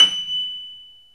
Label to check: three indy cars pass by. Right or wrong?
Wrong